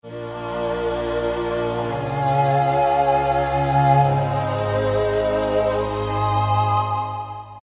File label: Thinful, Roland PN-D50; Roland PN-D50-01